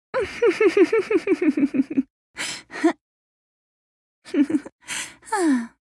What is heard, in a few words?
laugh; Ahri